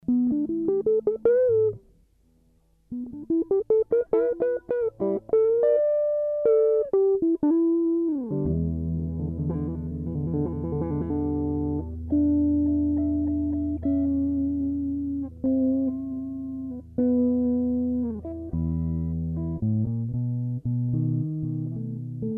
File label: LP Custom Neck